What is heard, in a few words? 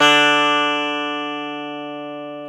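E 12 String guitar; Club-50 - Foundations Roland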